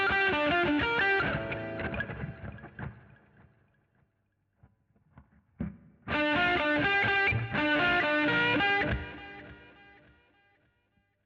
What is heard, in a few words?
GTR Solo